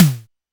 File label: snare elec, drums; Ableton Foundation FreePacks